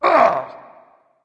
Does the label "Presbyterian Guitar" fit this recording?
No